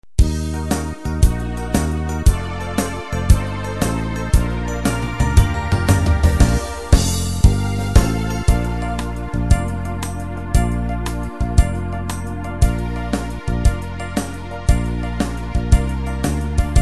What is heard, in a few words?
Shuffle Fox, KORG Styles